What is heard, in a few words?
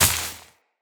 Step Veg, Footsteps Walk; Characters; Tenno